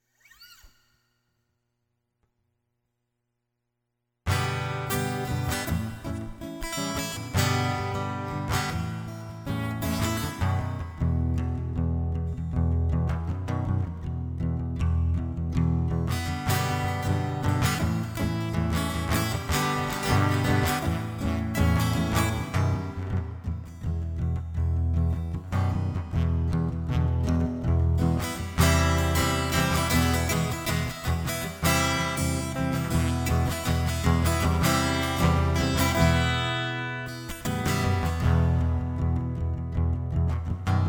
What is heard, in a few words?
Guitar Instrumental; hyper terrestrial